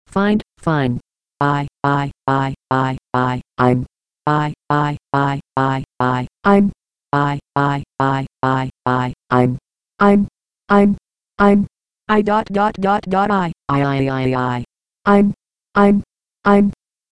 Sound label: Voice Synthesizer